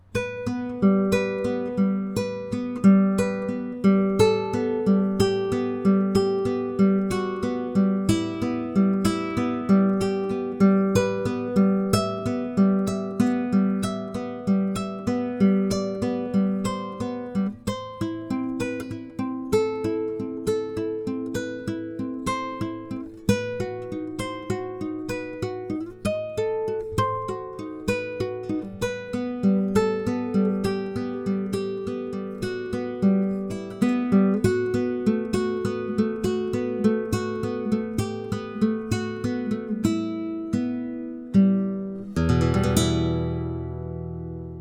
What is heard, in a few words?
Romanza slow